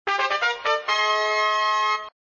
Sound Fanfare; flipper

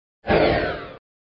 Sound SFX, flipper

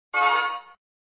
Sound SFX, flipper

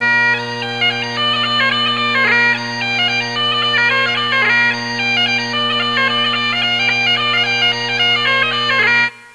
Music Bag Pipes

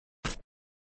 Step; flipper

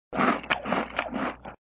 Sound Lip smack; prior to burp; Indy

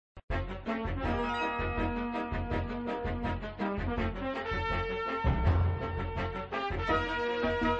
Theme Main Play looping

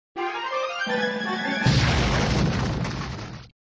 Sound Got Jackpot music, flipper

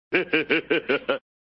Laughter; Mola Ram; flipper

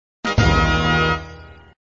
Music, flipper; sttng

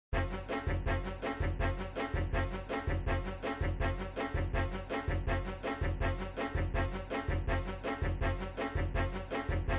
Music Shooter Groove looping

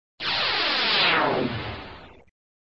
Sound SFX, flipper; sttng

